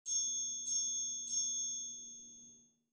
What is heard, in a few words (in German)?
Triangel; Instrumentenkunde